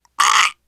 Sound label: choke, teengirl; player; survivor; voice